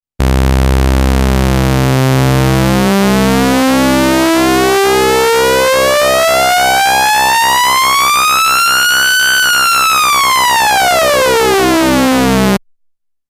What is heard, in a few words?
vco sync